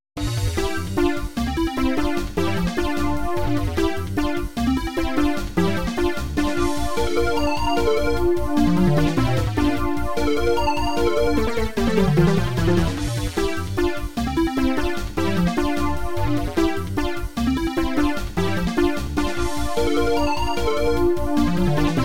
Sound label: midi